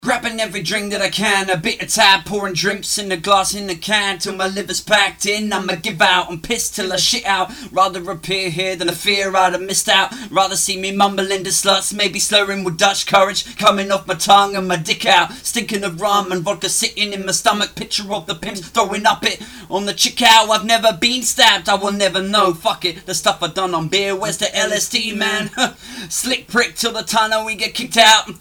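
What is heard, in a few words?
hip hop 96 A minor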